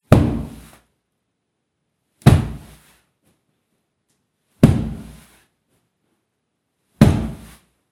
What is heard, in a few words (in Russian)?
zvuk podushki